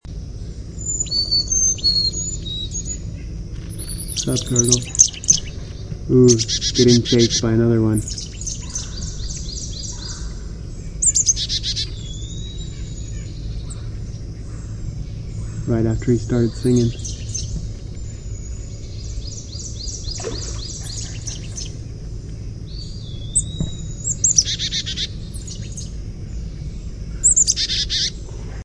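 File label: Carolina Chickadee chickadee gargle song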